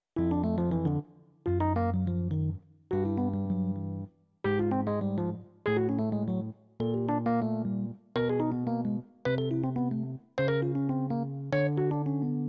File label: major 7th, jazz piano